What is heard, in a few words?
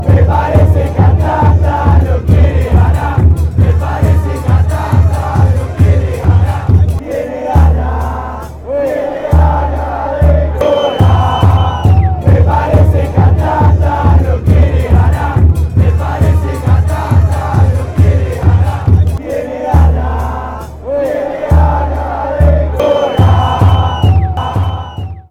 atlanta chant, fanchants